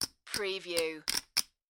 Poker Chips Stacking

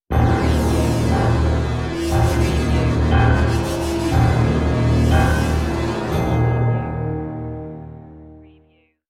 Dark Production Stinger

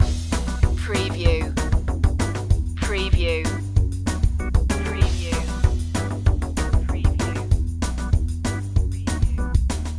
the spooky pumpkin head loop

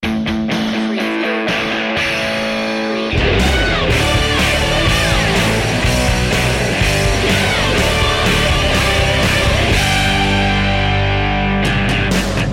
garage band sting

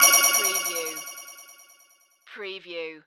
Multimedia Chime

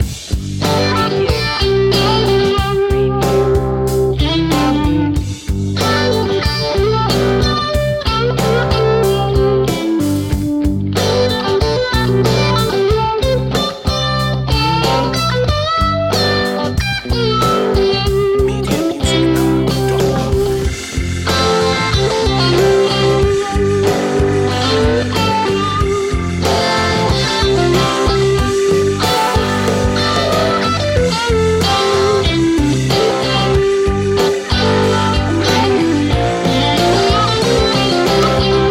flower rock loop

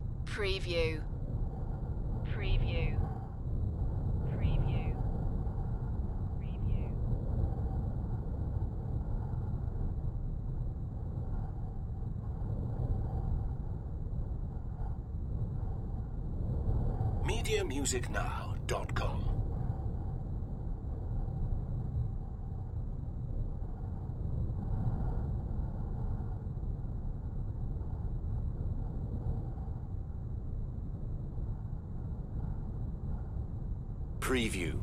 Strong Coastal Wind; beanstalk audio

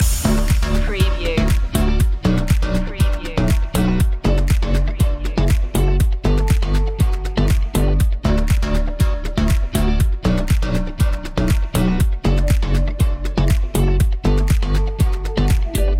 sax me up loop

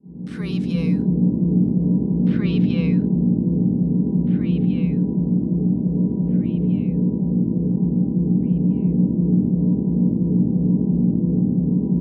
Power Drone Steady

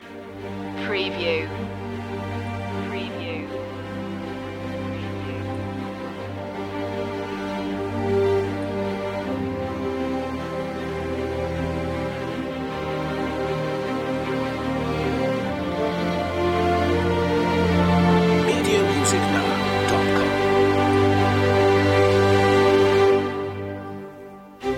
strings brass

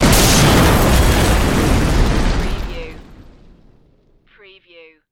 EXPLOSION CAR VEHICLE

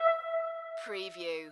musical

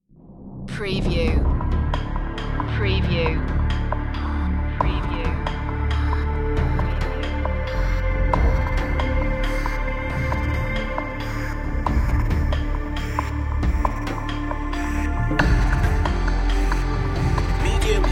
underworld series dramatic cue

